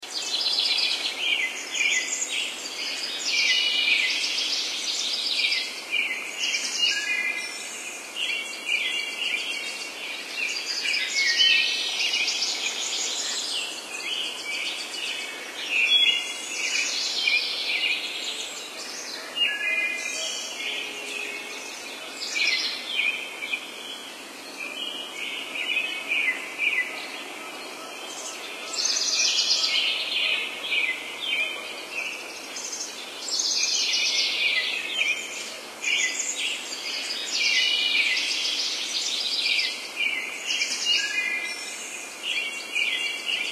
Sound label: ambient forest day